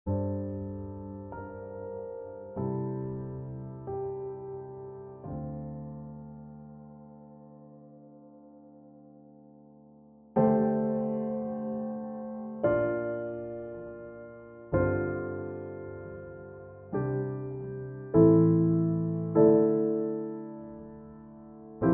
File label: piano improvisation; Spy vs Spy